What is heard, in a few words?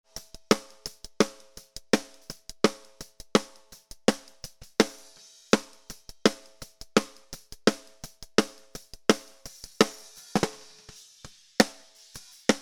Snare